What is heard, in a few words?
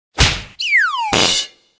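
slap and fall, funny cartoon sounds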